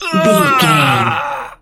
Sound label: voice male death